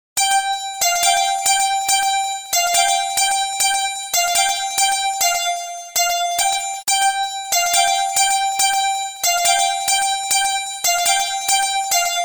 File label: melody pattern; Electronica